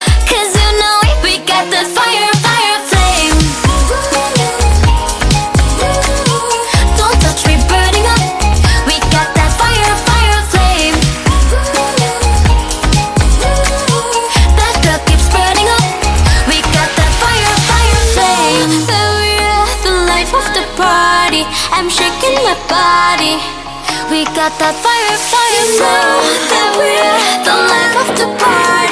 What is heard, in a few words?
Fire Flame